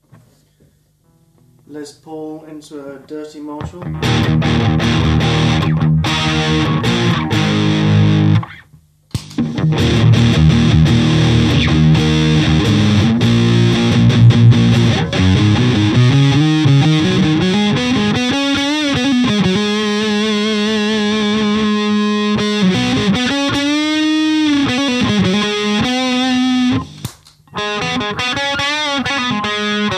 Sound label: TB Les Paul Marshall, Treble Booster; Treble Booster Mk II